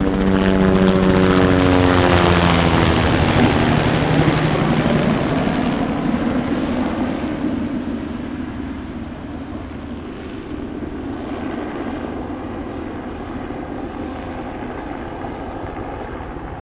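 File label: Heli By Short Head, bell 212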